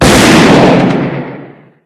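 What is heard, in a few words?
double barrel blast; weapons; dbarrel; gmod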